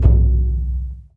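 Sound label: loud drum, Burundi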